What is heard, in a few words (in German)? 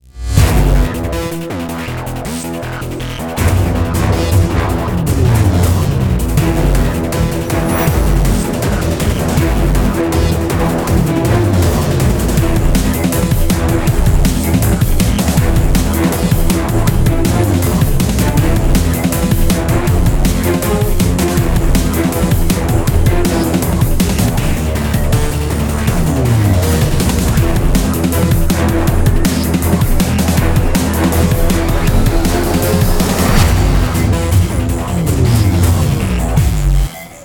Elektronik; Spacebourne